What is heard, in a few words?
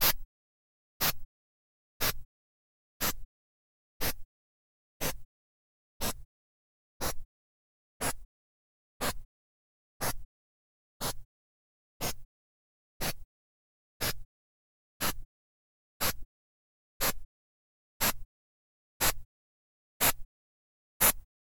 binaural, noiseburst